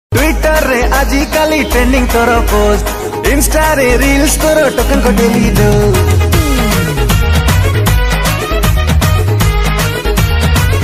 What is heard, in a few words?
Odia song